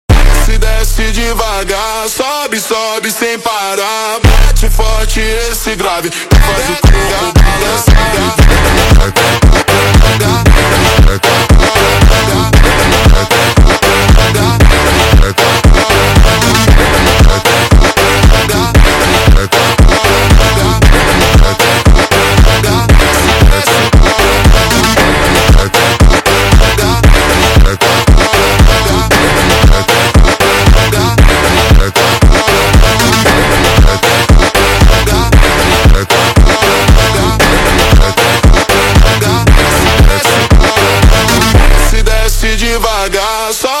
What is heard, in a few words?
MONTAGEM SLOWED